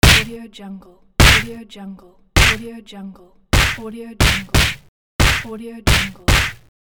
super punch; pashastriker

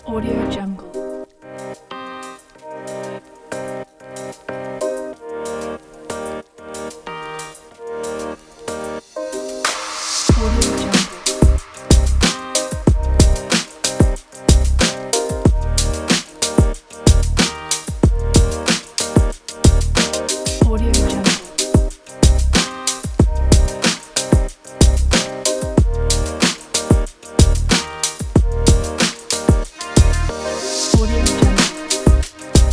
summer chill hop

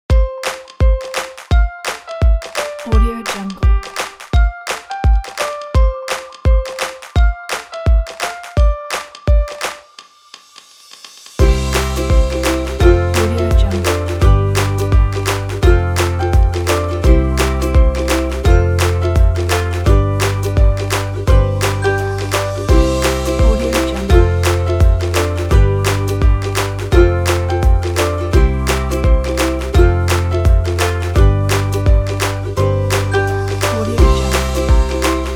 fun ukulele

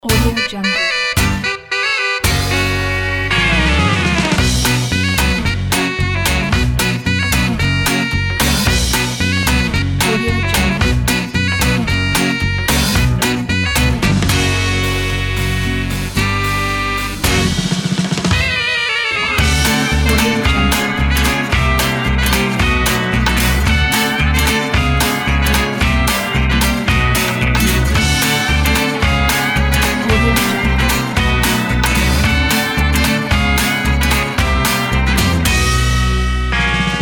gypsy cats

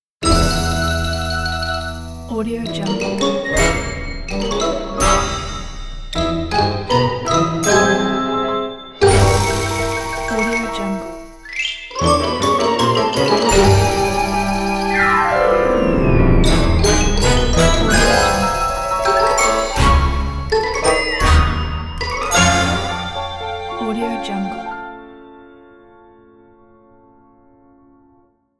weird cartoon logo; robert kincaid

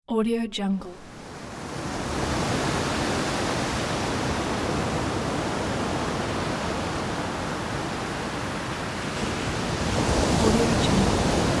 strong ocean waves

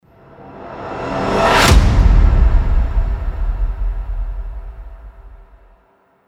cinematic hit

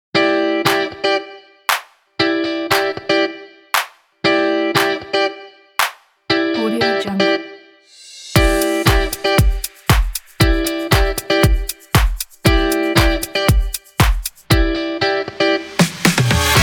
funk music

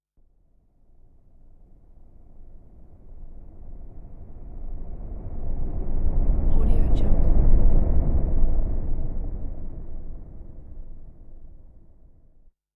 scifi slow heavy spaceship flyby

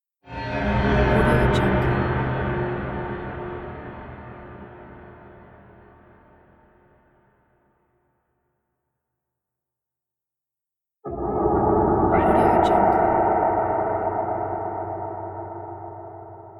discomfort ambiance for movie

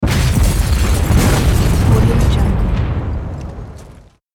big explosion

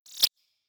crossbow arrow flyby; future weapons 3; crossbow arrow type 2; soundmorph

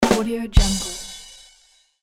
ba dum tss

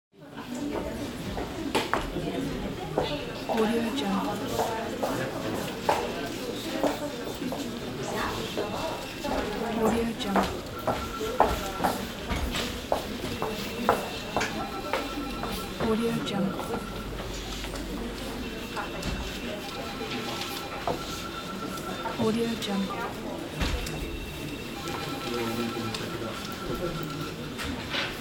office

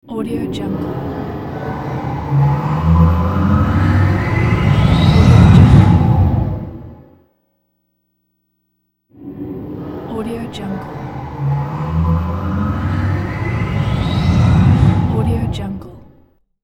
transporter mysterious bys

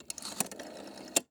telephone dial; prosoundfx

